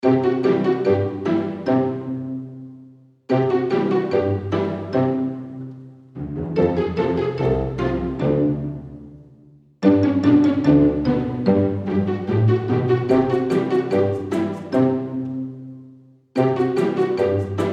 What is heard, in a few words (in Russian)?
cartoon, schastlivaia detskaia muzyka